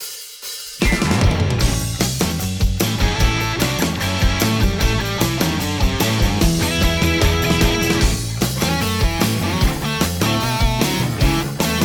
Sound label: Ground BGM (Rock Version); Mario Kart World